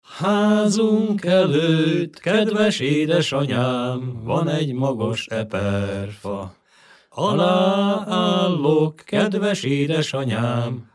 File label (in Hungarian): nepdal; házunk előtt